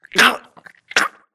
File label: grunt death neck; Titanfall 2